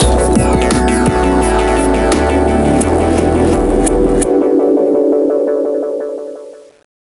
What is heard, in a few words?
Industrial Fail